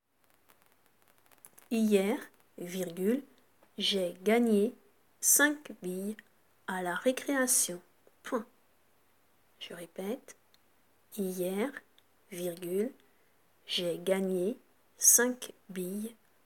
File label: Vocal